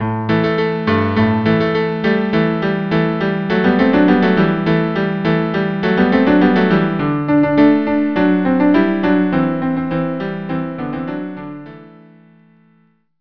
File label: cello cello; Debka